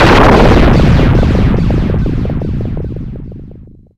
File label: xplosion